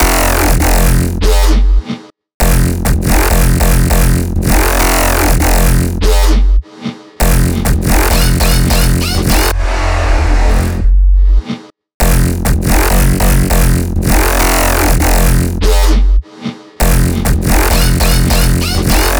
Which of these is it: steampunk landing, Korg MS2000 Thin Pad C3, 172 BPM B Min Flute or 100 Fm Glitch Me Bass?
100 Fm Glitch Me Bass